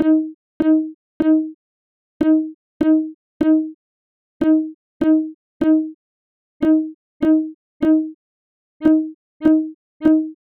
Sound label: Violin vs Violin